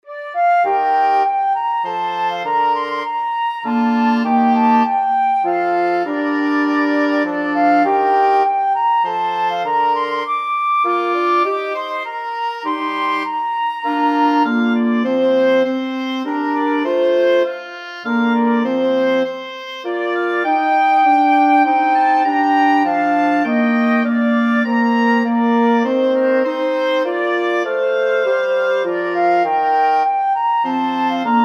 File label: wind quartet; holst jupiter